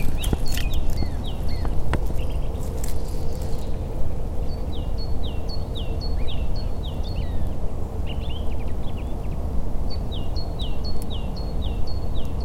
plusieurs oiseaux

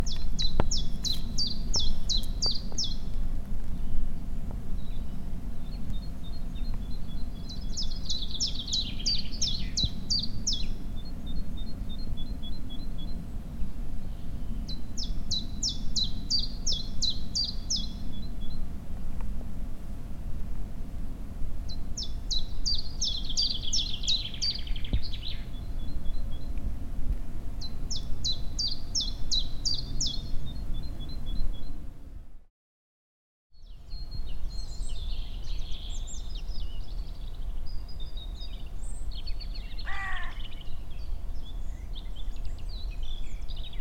oiseaux bas du talus

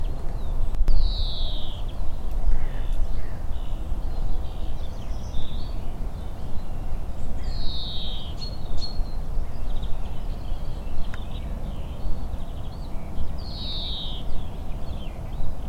Geai

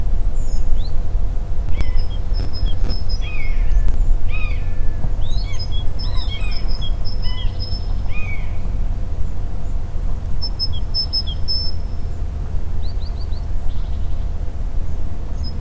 Mesange ch Buse Grue cendree

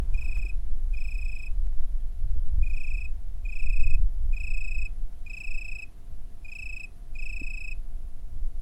Grillon Italie

